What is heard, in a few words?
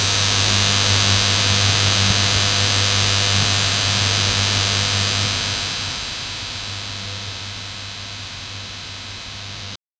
sharp electrical arcs and; electricity